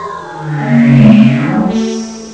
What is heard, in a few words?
space noise; Amiga Soundtracker Sample Packs